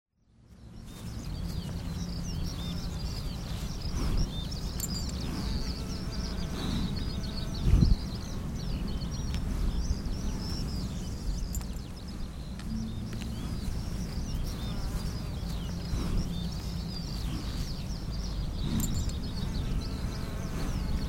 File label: Garden Ambience